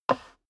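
step dress boots wood creak slide short, footsteps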